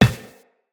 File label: Run Glass, Footsteps; Tenno